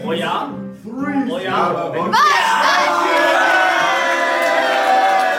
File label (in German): waschmaschine